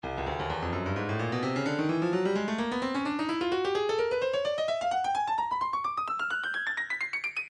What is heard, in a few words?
stylus breakbeat midi files 129 bpm; Stylus Breakbeat Midi Files 125 129 Bpm; Park Jam